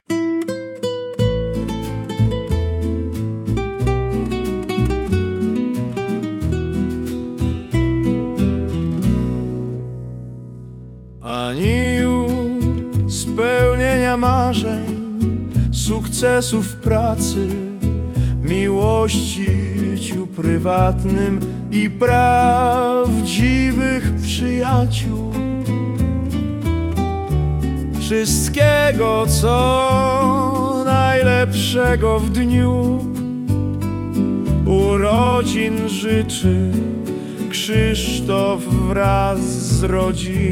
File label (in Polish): spiewajace zyczenia latino